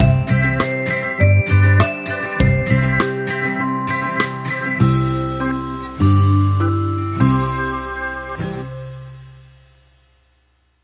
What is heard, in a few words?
Rasta Surprise, Sting Upbeat